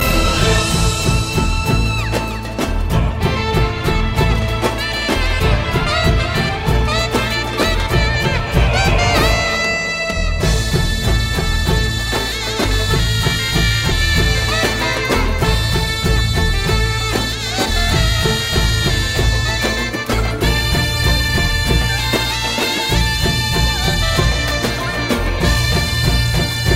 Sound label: Fight Music